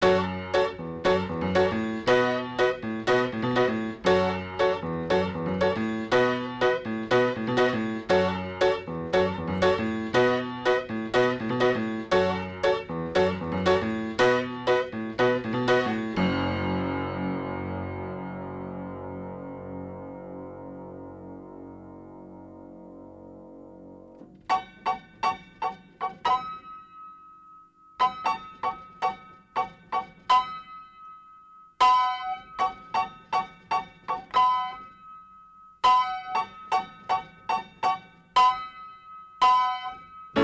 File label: piano